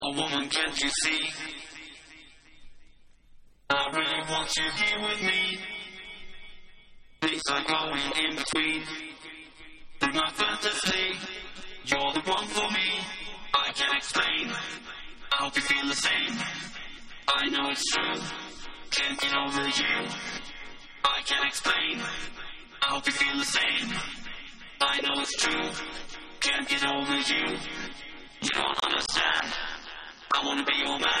Acapella

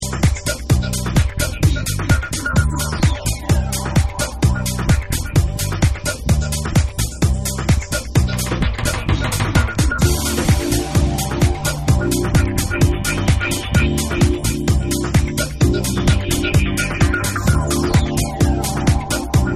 Tribal Mix

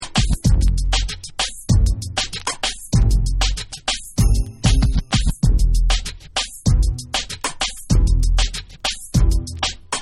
Instrumental Dancehall Remix

